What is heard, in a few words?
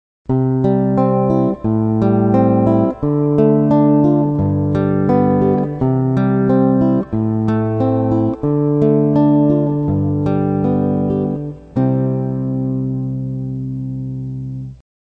guitar